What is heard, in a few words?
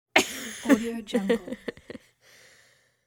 young teenager laughing